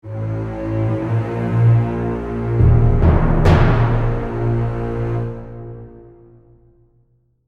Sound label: Stinger, soundtrack